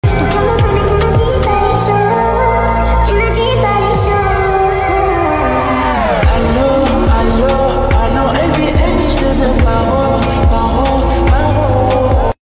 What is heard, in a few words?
slowed reverb